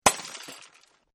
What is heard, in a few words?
Glass Break; Materials; Partners In Rhyme